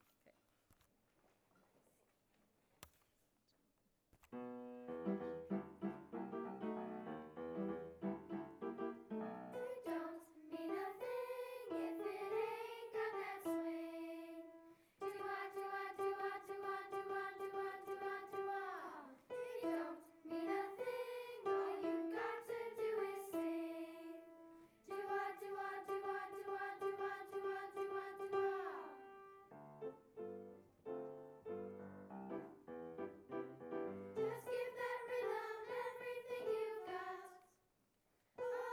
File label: soprano